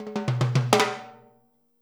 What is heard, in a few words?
FILL, Percussion